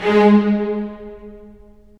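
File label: STR, strings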